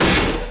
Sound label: Kick; 黄金拼图